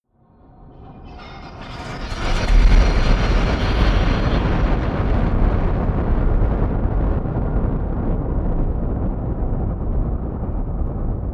eerie explosion large rumble

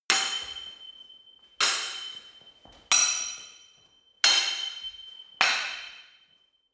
sword hit sword sequence

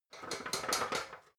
ladder metal rattling

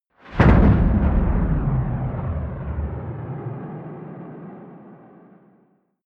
missile small fire stationary distant

